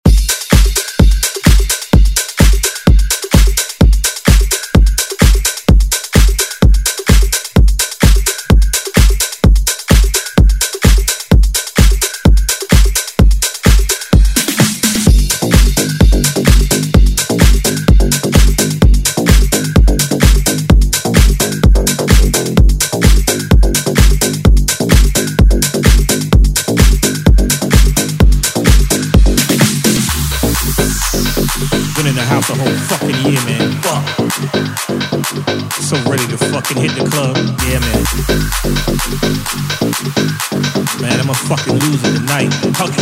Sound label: Dance Redrum